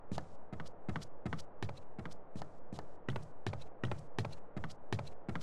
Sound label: Surface wood